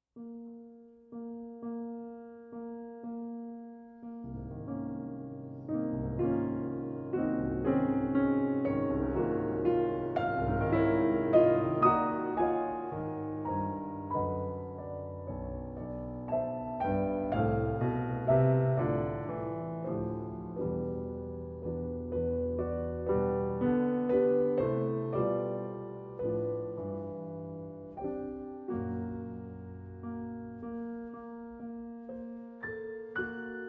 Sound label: Bride's Dance for Piano